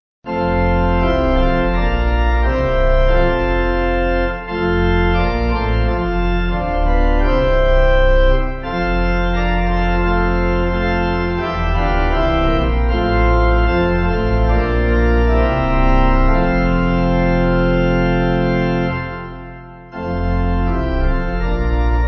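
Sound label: Pipe Organ, Music Backing Files for Churches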